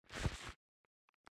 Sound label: grabbing